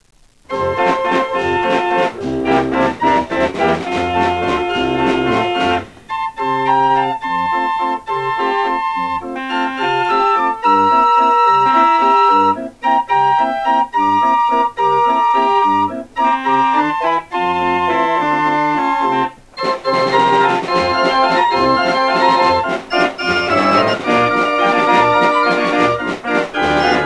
Fairground Organ Style

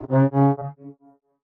Trombone; Special-Photo Impossible-Triangle

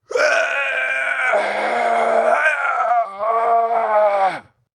Long Defeat

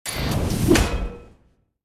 Skill Subdue

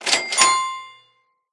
Sfx Baseball

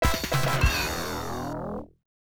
Lottery Lose; Cameltry; F2 System

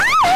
Hurt; unsighted

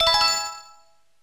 coin; Janken Game Acchi Muite Hoi; Deco MLC System

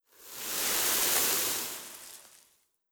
Burying

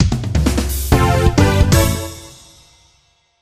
Jingle

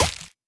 Paper plane Hit; In Power Paperplane Hit

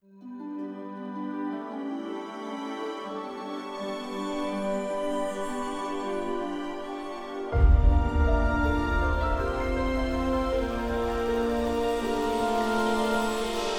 forest music

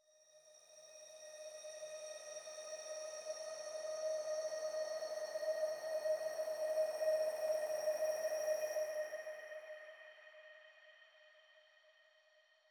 shard Light

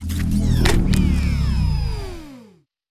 Defense; starbites

